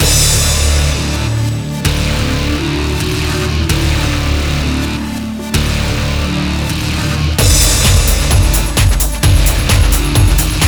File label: Rave Music loop